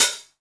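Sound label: Closed Hi-Hat, Dance Drums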